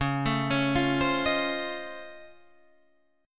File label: Db Augmented Major 9th, Guitar, Open D